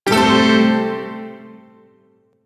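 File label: Shawzin Major Chord; Warframe; Duviri Shawzin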